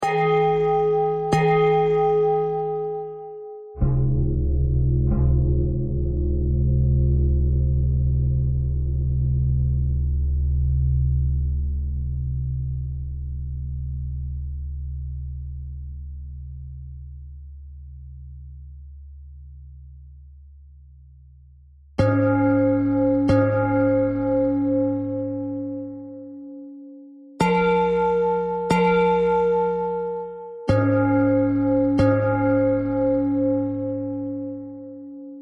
bell threads

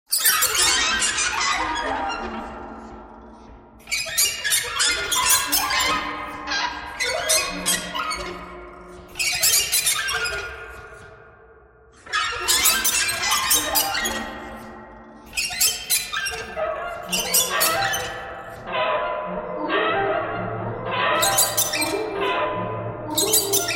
birds thread noise all

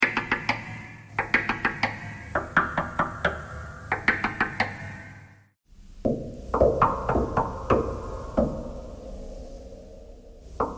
knocking thread noise

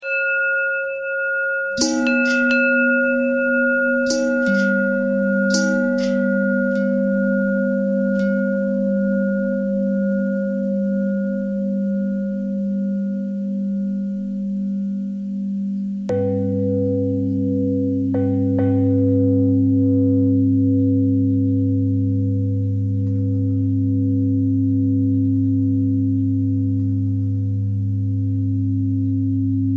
longbell bell bendthread

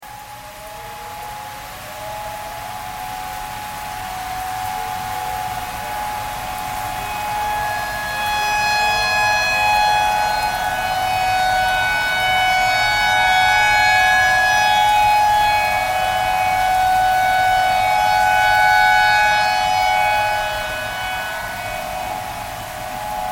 tornado siren tornado siren thread simple

